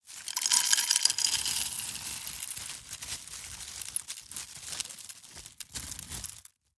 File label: Espresso Pouring Coffee Beans into Bean Hopper; Systematic Sound